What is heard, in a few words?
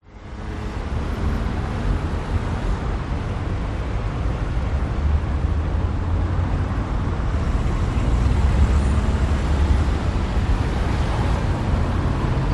France Traffic; audio hero